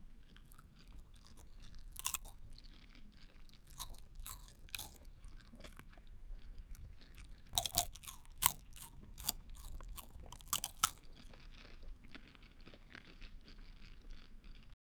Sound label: the crunch of chips, chewing